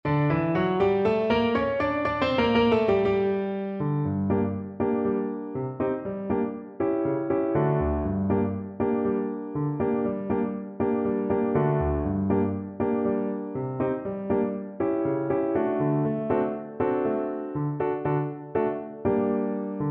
horn, French Horn, freylekhs

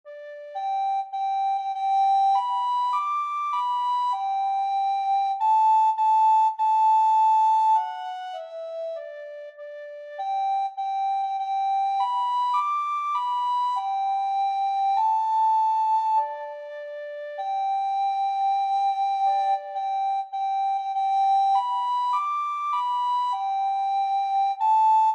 recorder

